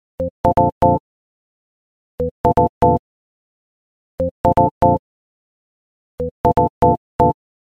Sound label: organ